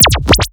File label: Sound FX Plasmatic